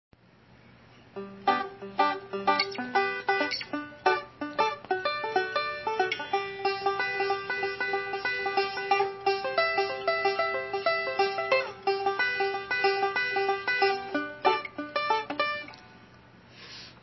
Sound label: chorus, banjo